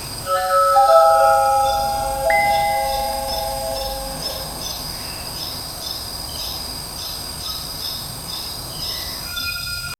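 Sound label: bright cheerful and adventurous; school bell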